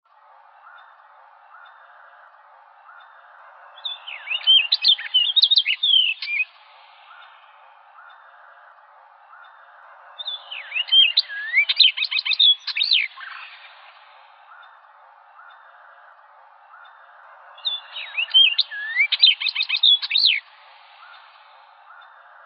Orchard Oriole

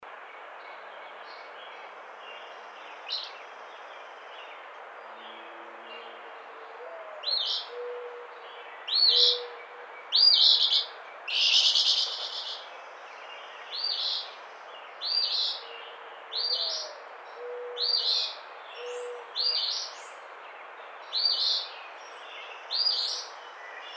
Eastern Phoebe

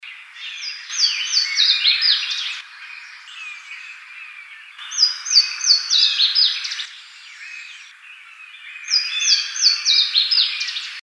Yellow-rumped Warbler

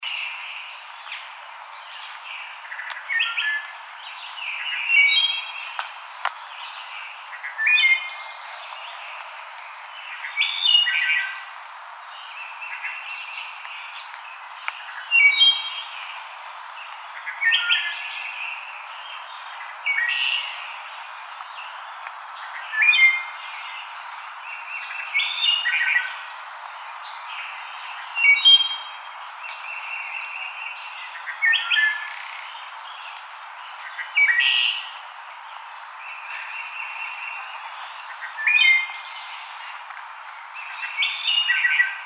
Wood Thrush

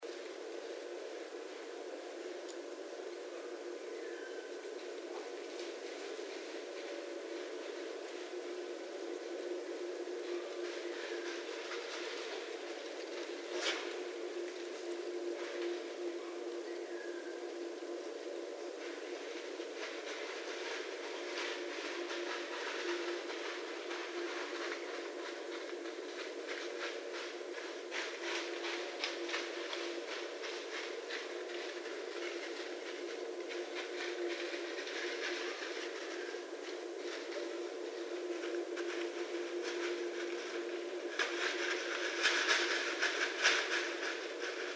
Red Fox

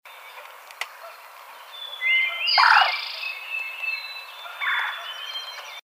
Red-bellied Woodpecker

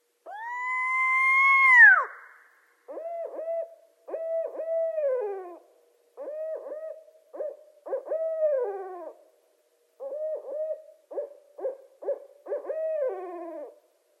Barred Owl

